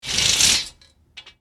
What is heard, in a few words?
Metal rail curtain rings sliding; blinds